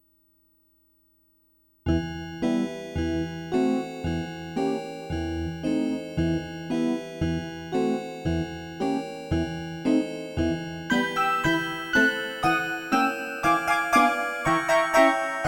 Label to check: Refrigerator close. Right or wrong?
Wrong